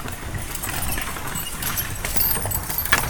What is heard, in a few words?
Horse Drawn Wagon By; Electa Quinney